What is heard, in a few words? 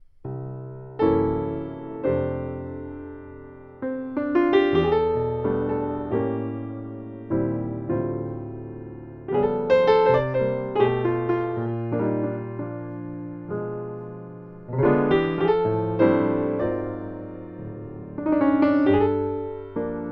PIANO